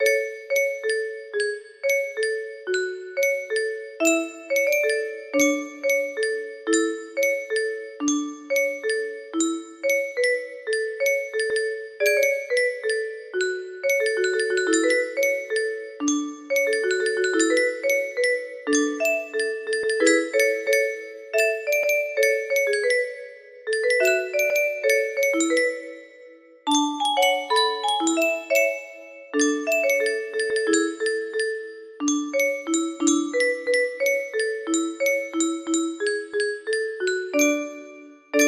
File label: melody, music box